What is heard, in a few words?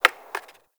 Tree Pine Branch Break; Ambience; Trees